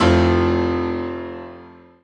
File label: Synths C; Vengeance Samples